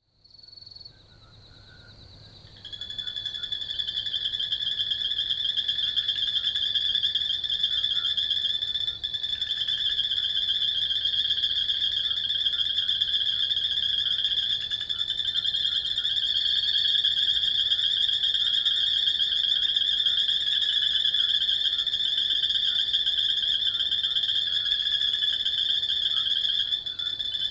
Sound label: water hole night bird stutter